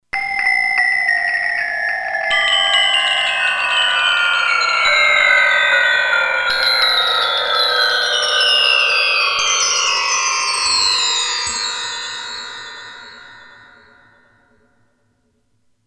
Mark Tree

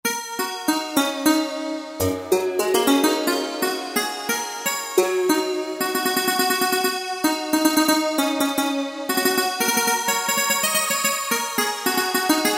China Guitar, Roland JD-990; Factory Patches

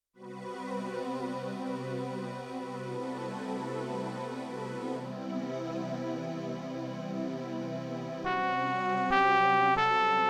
Breath-Trumpet-Split, Yamaha TX16W; Synth Set

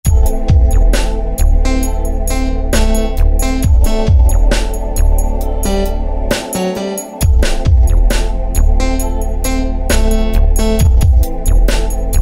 Trip Hop Pattern, Roland MC-303

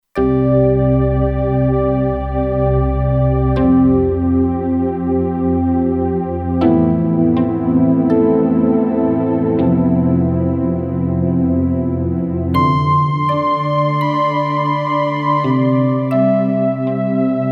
Meditation Pad, Kurzweil K2500X; Factory Programs

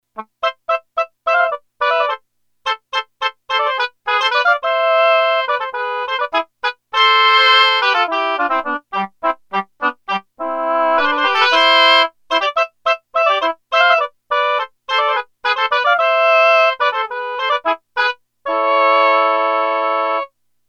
Oboe song

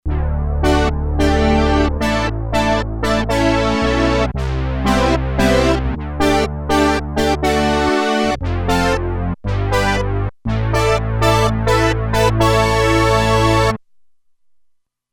PWM Brass, Roland Alpha Juno-2; Original Sound Chart patches

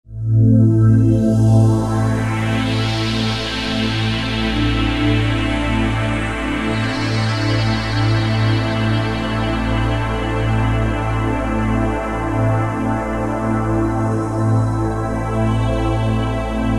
Tsunami Warning; Korg TR-Rack

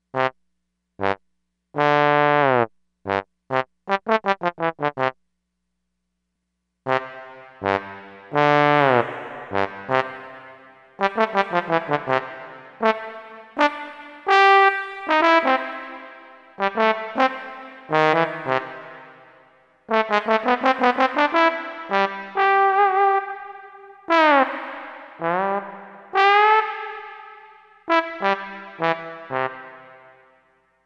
POST EQ REVERB

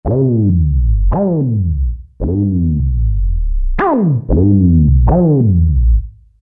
Bobs Slide, Roland SRX-05 Supreme Dance

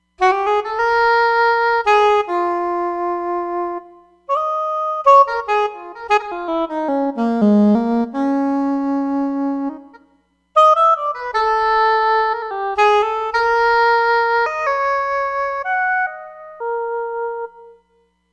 Soprano Sax

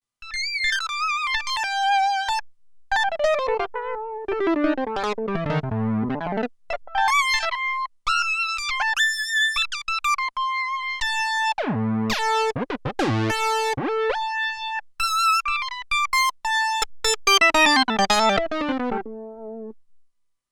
Lead

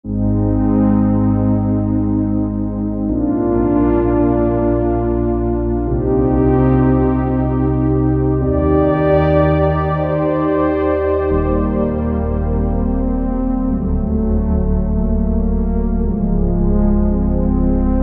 Warm Fuzzy; Korg MSC-05S Piano

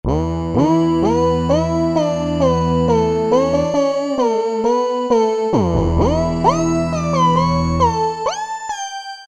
syn, E-mu PK-6

